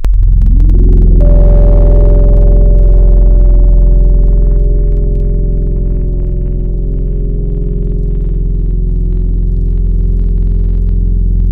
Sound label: Futuristic, Tropical Mallets; Synthetic's Serum Collection; SparkPackers